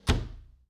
Foosball Center Slide

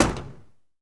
Mustang Door Close; SFX Kit; Automobiles

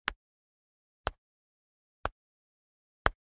Generic Click Plastic Small

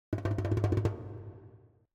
Drums Short Drumming - Fast Hits - Medium Drum; Hollywood Edge; sound designer's toolkit v2

